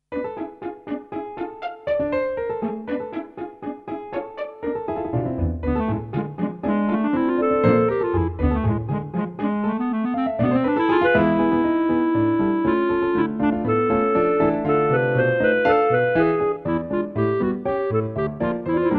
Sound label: Dance with us